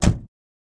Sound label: Corolla 83 Door Close